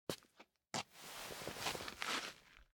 Crouch; LOOPERS

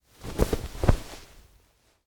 Hugging; LOOPERS

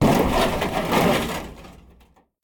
Kick Cupboard; LOOPERS

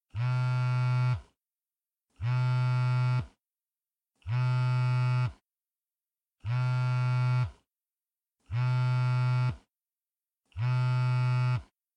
Dial Tone; LOOPERS

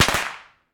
Cracker; LOOPERS

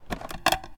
Open A Treasure; LOOPERS

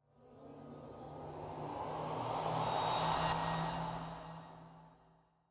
Go Through The Gate; LOOPERS

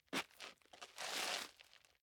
Look Back; LOOPERS

